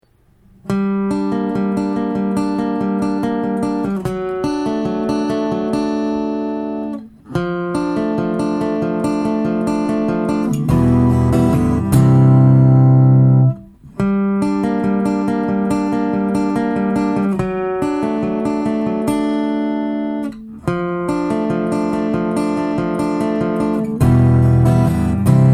Akustische Gitarre

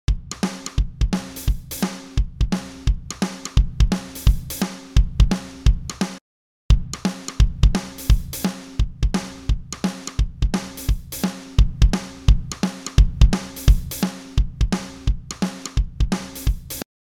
Sidechain Kompressor ohne und mit HPF im Sidechain

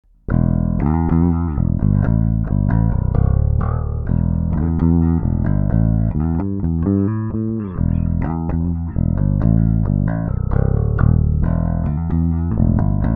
Bassline alles auf 12; Spector

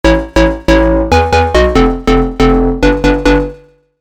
Glockenbass

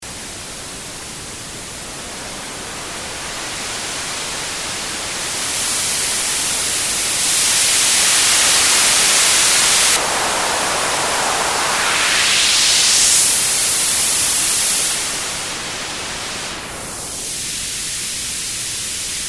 Great River Harrison32 High Band White Noise cut Boost Und Shape

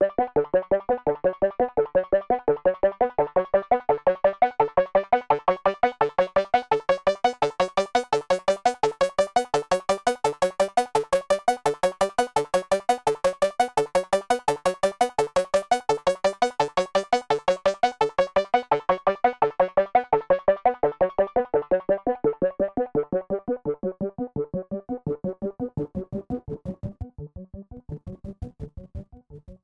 Korg MS20 Sequenz LP Sweep

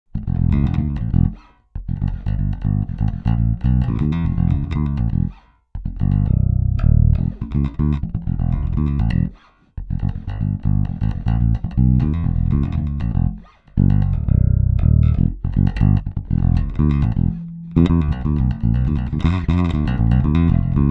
Sadowsky NYC5 passiv beide pickups VTC voll auf

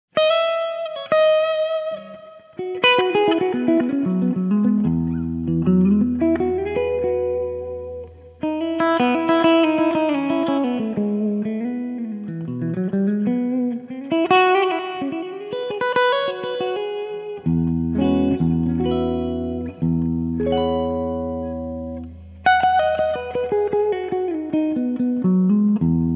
Beide PU parallel Kanal 1 Klangregelung 12h viel Reverb

